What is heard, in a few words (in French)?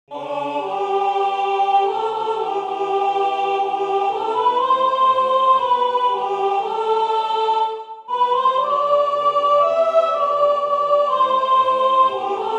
Le refuge soprano, chorale